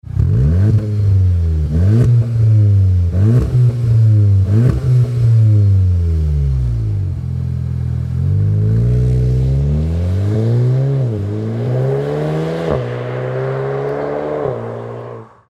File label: VW Golf VII GTI OPF OPF Back Racing Rohr+ESD; REMUS